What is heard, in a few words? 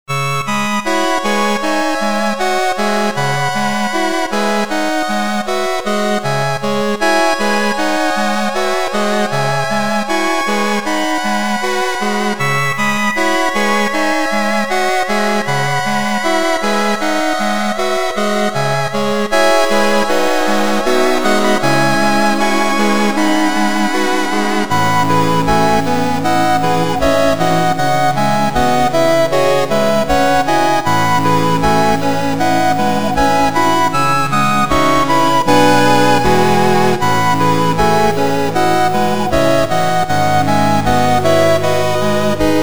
Acid woods; Stage 2